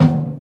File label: natural tom drum single hit d sharp key